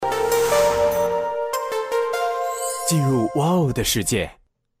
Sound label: boot Sound wo 3g; China Unicom; China Mobile